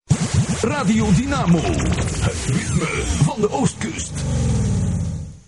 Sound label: jingle; Dynamo Knokke